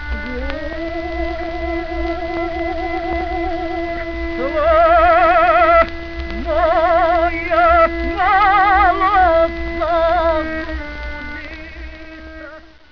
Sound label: guslar